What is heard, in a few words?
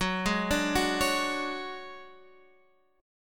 D Major 7th Flat 5th F sharp, chord